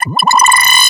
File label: Arcade Power Up, Video Game Sounds; 1001 Sound Effects